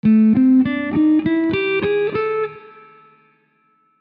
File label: Ash wooden pick; SixStringers